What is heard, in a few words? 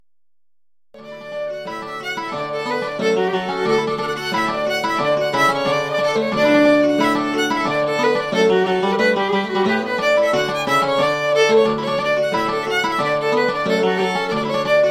fiddle